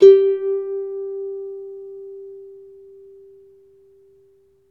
CELTIC HA, Celtic Harp

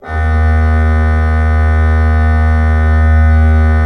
HGRP D1, Cathedral Organ, HOLZGEDACKT; Propeller Island

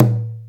bata, African

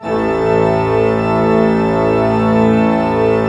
A1, Cathedral Organ; Propeller Island; PED.V.WERK

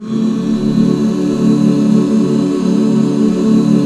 D 7, back chords; Sound & Vision - Gigapack II CD 1

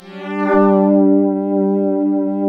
PAD Pulse Pad; Best Service Gigapack 1

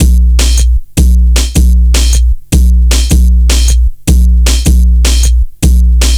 155, breaks

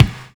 CLEAR BEA; Univers Sons - Kitch 70s

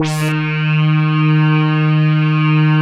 BURST; Big Fish Audio - First Call Vintage Keyboards

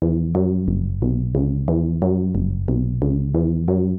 SH101 Bass Loop (120BPM), retro house

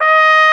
warm trumpet D#4; InVision Interactive; Lightware VOL-1; Instruments & Percussions

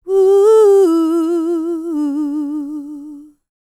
LICKS, Vocal, R&B; ILIO; Dance; LT R+B

